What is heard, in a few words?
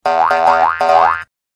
skill bounce, battle